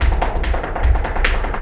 Flamenco, ZAPATEADOSOL

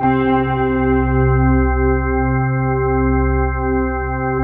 ORGUNES C3

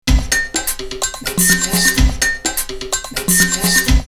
126, drumloops, Techno&House; X-Static Goldmine; 90 s Sample CDs

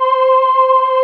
ANA CHOIR; Pro-Rec - Synthsonic; JV ANALOG

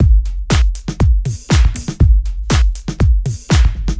4 floor beat, trance

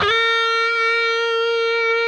PRS B, PRS LEAD, Guitars; Spectrasonics; Hans Zimmer Guitars VOL-1

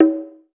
Shimedaiko, Taiko, Percussion; Roland BK-5

